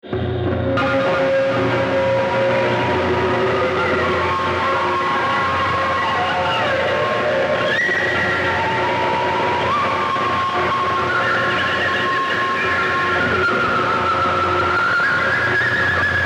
Improvisation

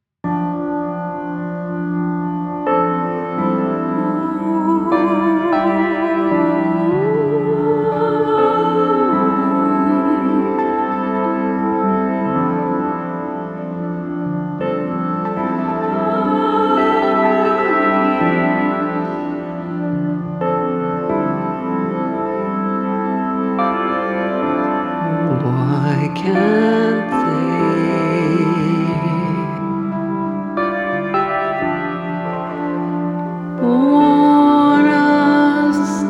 Bells

